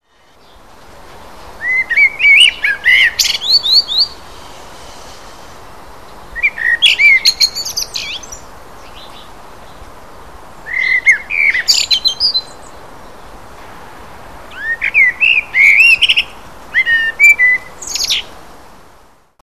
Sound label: blackbird